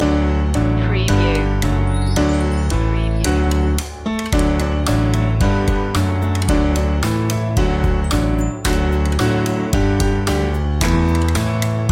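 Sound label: bingo instrumental loop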